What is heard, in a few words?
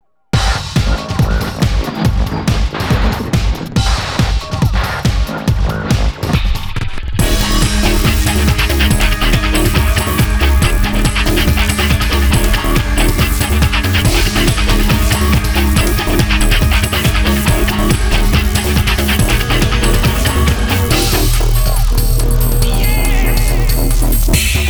Boss Battle; Vampire Rain Altered Species; PlayStation 3